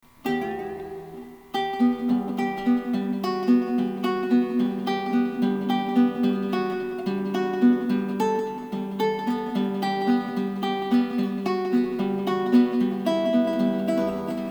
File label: guitar fingerpicking